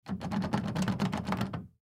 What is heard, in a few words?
Door Knob Key Shake, Door Knob Key Turn Locked Stuck; Locks; Foley; Objects; Blastwave